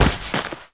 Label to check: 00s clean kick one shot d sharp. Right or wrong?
Wrong